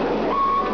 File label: beep